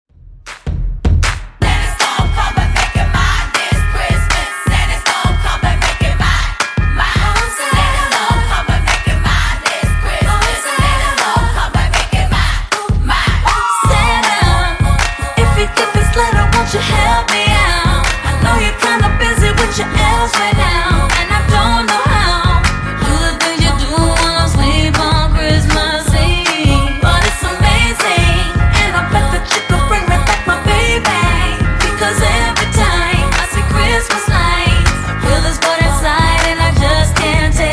Funk Intro Outro 157 Bpm

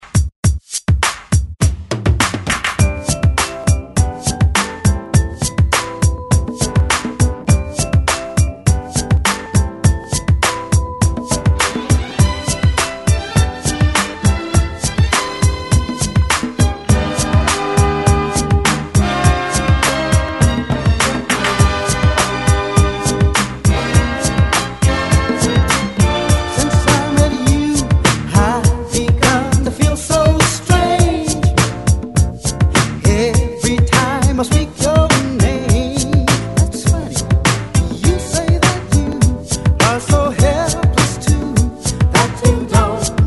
70s ReDrum 102 Bpm; DJDOLLS

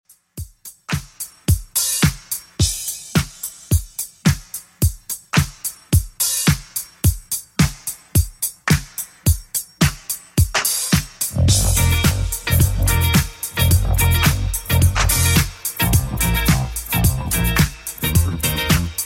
80s Redrum 108 bpm

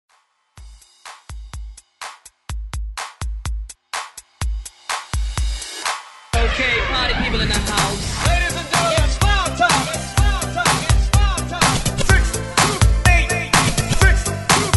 ReDrum 125 BPM

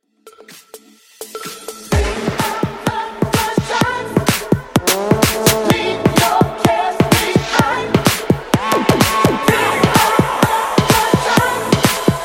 Mashup; Remixfreakz

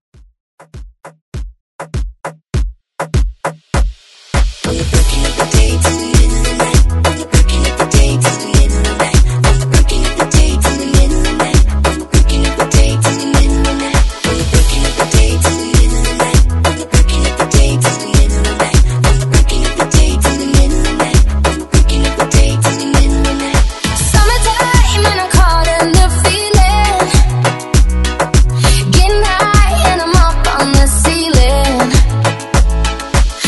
Moombah Remix

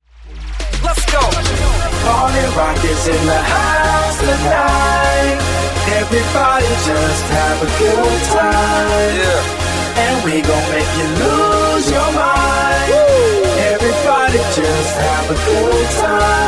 Funk Redrum 125Bpm

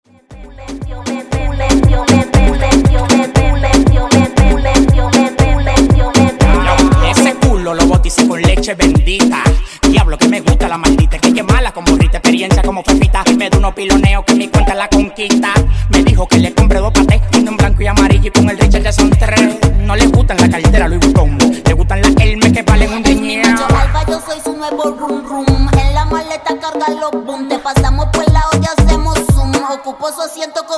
Blend 118 Bpm

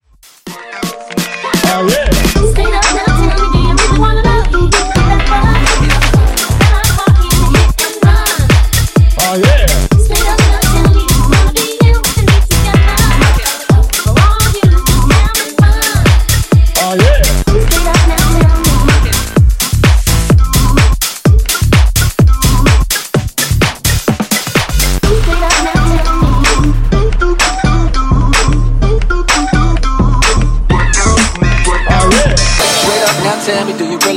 Straight Up Hooks, 127Bpm

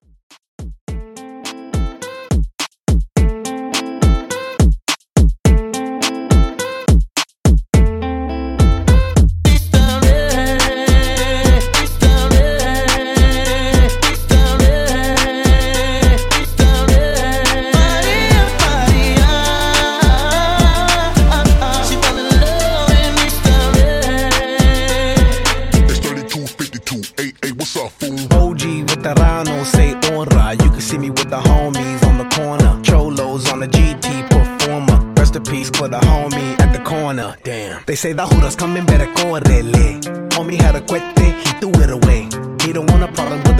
105 Bpm

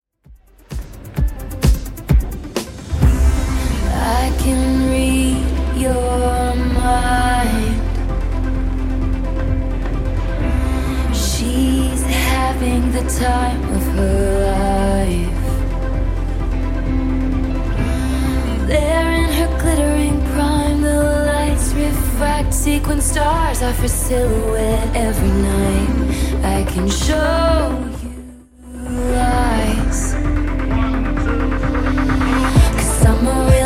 Top40 130bpm DIRTY; Redrum

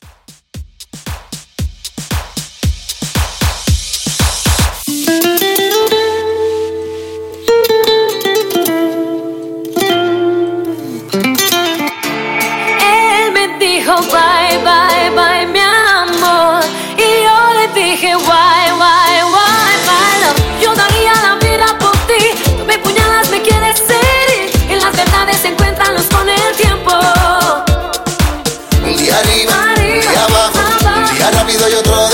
Latin Reggaeton 115 Bpm

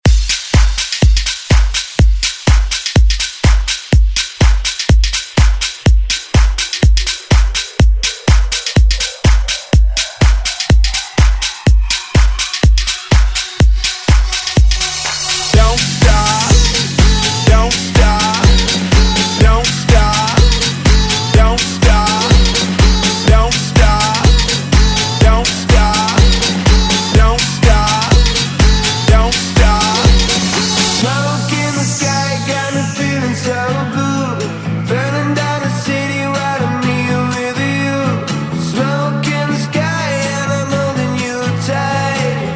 Dance Hype; Redrum

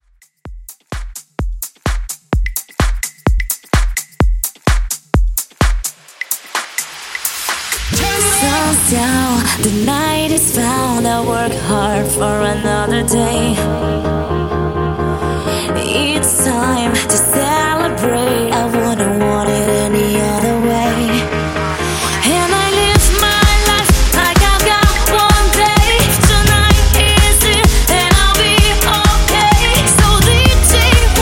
Epic House 128 Bpm

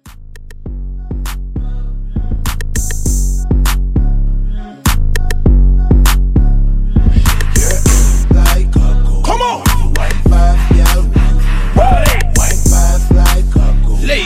Hype 100 CLEAN

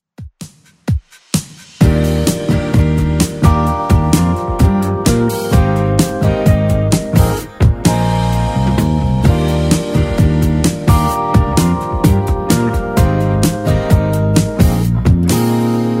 70s, 129 bpm; Redrum

